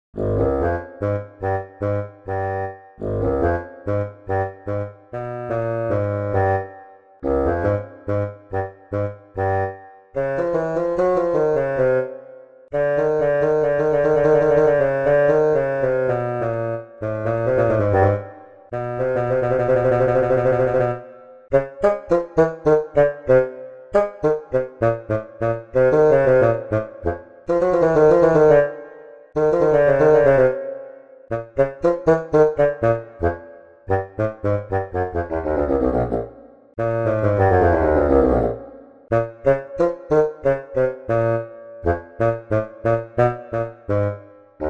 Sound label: Bassoon